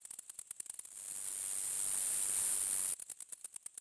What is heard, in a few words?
crickets; ambient; World of Tanks Blitz; rudniki